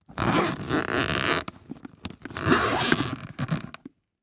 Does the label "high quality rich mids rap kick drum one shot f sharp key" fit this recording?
No